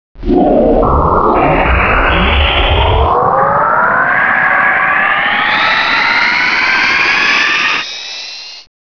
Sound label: manta takeoff